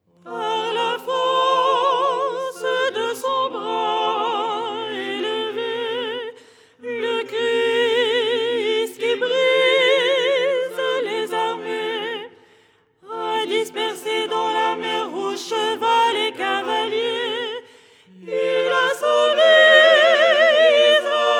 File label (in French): soprano; 1er hirmos du canon du dimanche; Les 8 tons en français